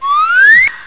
Whistle Up; Acorn on the Moon

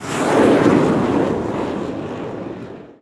thrust

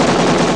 Gun (Automatic)

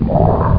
cybermonster; CyberNation 1.1.1

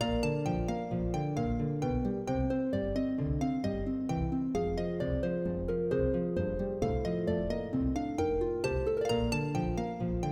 loop; tetris; radon

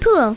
POOL; funtown

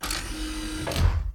Close Window; Net Power 2000 #2

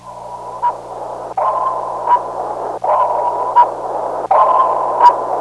SONAR PI, MILITARY; Easy Personal Web Page

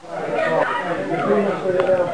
auction; Knockoff

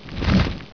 fly; Hexen II; imp

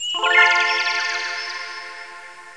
Flower; max

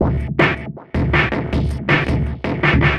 DRUMLOOP D+B 160, Drum+Bass Loop; eJay

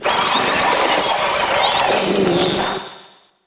octopus die; DAoC

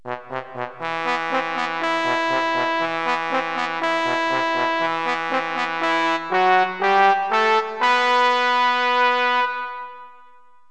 tromb; Roland; An Invitation To The Roland World Of Music

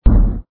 eng hum down; Lander

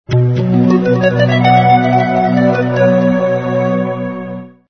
MP3 music

